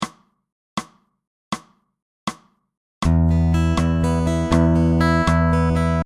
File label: fingerpick eighth note triplet, guitar